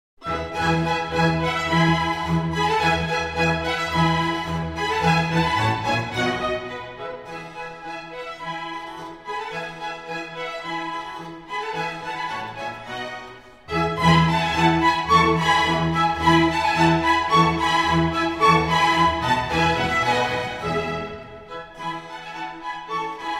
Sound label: Violin Concerto in E Major Allegro